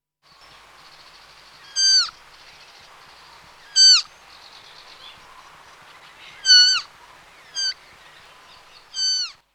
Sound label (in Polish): koza